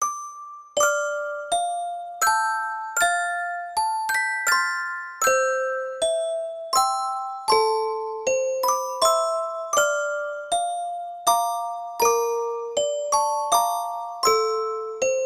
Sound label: Greensleeves Orgel; quilt original soundtrack